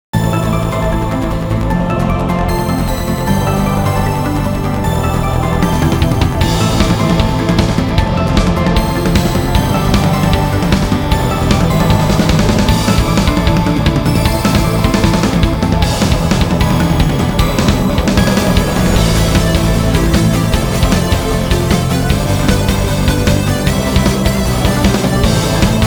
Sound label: battle